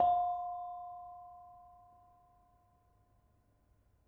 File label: Bonang Barung, gamelan